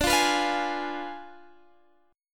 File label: G sharp Diminished 7th